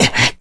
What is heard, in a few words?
Gasp; Link; An unidentified gasp